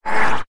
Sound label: metal scrape